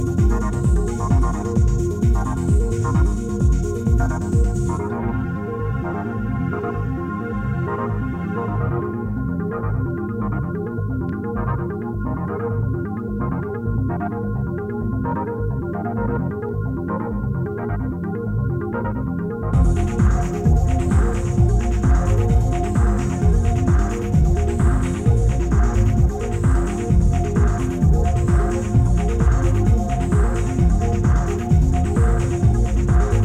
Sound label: advanced tribal rhythms